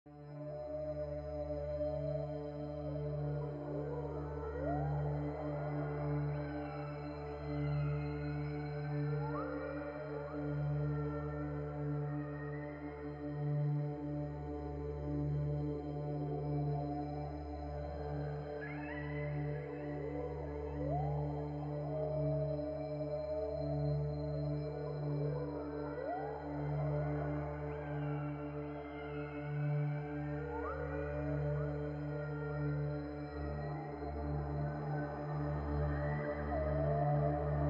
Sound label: deep sea connect dolphins spiritual frequency